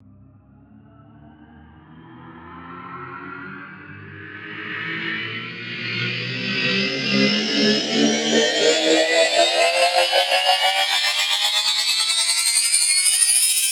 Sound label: Riddim Riser; One Shots; Ancient; Ghosthack; Riddim Essentials 2020